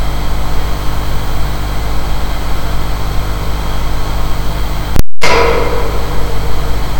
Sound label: Beam On TED; lhc collimation